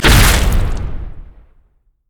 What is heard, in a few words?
savagery five stack; rengar